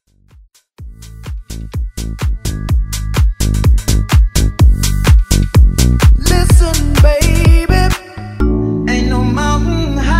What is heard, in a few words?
Vocal House